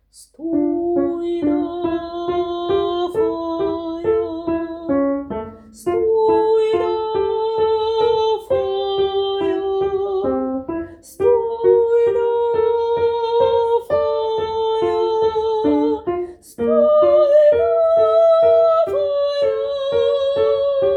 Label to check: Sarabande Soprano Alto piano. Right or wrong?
Right